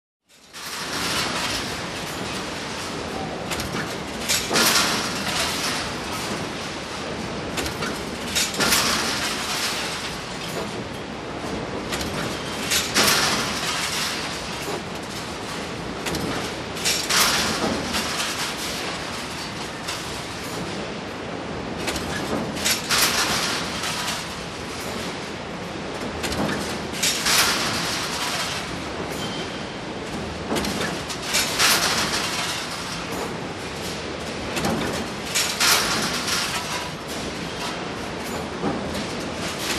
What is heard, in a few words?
Scarps being pilled up